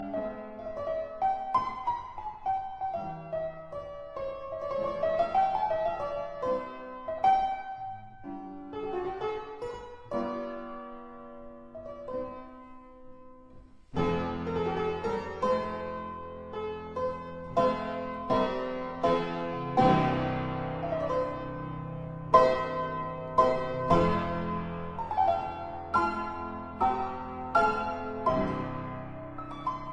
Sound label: Keyboard Grande Sonata in C major, Andantino; Op 16